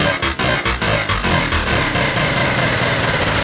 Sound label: glitch